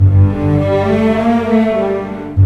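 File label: 100, orchestra arpeggi; East West; Scoring Tools; Myst Arpeggi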